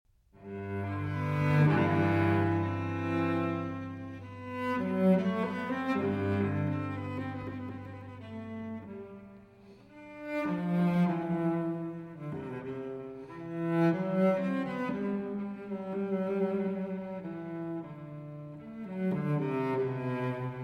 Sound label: Unaccompanied Cello Suite No. 1 in G major Sarabande; BWV 1007